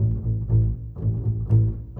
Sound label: Bass, Orchestral; Sonic Foundry (Sony Creative Software); Orchestral VOL-2; Modern 11